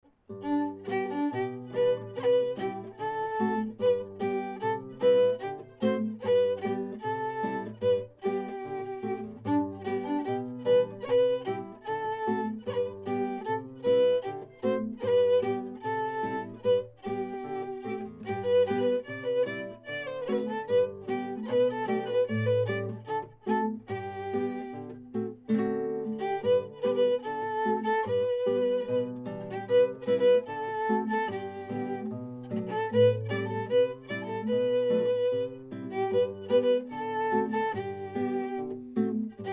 danses bretonnes traditionnel breton

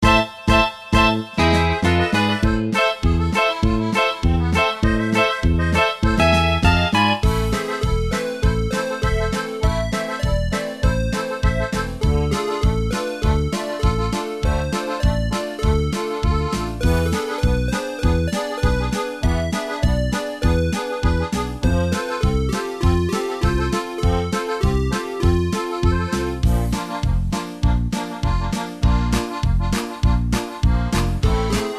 tex mex mineur

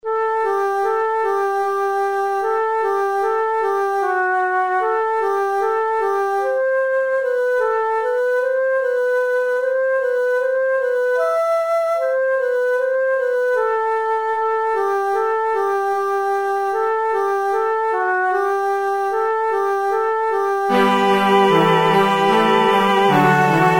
concerto pour flute orchestre cordes

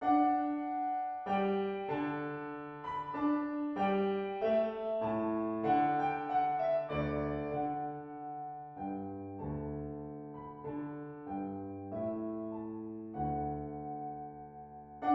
menuet majeur; lully jean baptiste

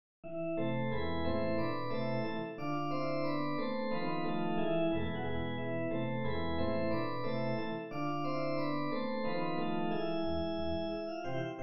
rigaudon mineur; babell william

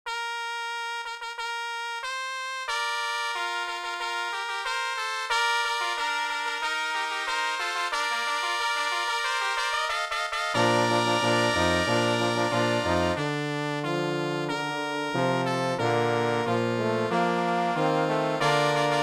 fanfare

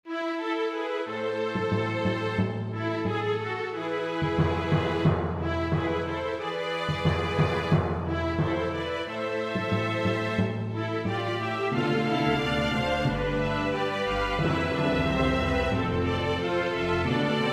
marche; des patriotes

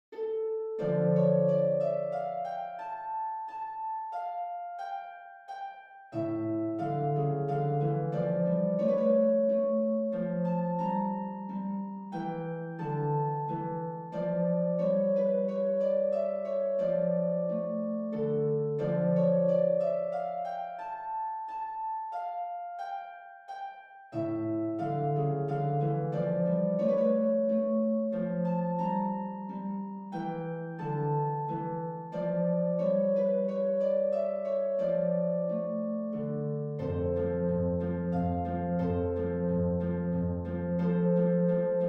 menuet mineur; lully jean baptiste

